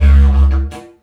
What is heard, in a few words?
Didgeridoo Kit Samples